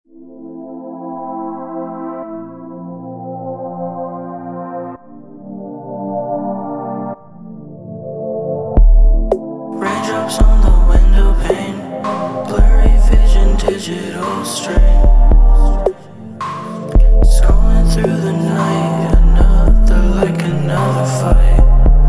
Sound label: raindrops